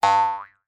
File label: cartoon spring bounce twang